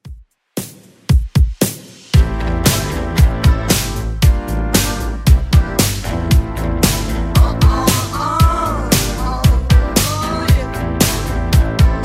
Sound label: 80s 115bpm; Redrum